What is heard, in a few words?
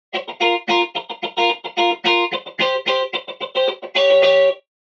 funk guitar wet